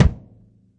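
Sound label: thick acoustic bass drum; Kicks; single hits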